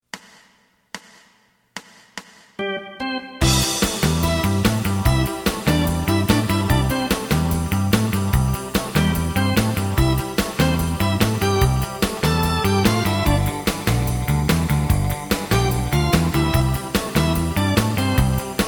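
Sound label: side guitar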